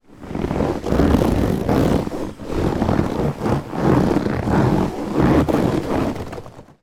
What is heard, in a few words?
leather chair cushion movement squeak creak; household